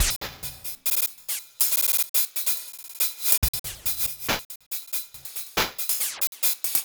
Hi-Hat Esoterica, Beats

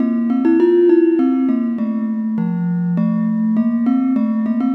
Pelog Gender Melody; Melodic; Ambient-Melodics-II

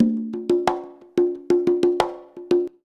CONGA, Modern Percussion Loops

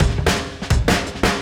Beat Style E 170, breaks; 170bpm

